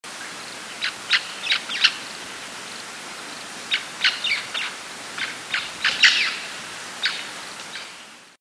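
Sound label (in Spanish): loromaita, Loro Maitaca, Pionus maximiliani, Scaly-headed Parrot; cantos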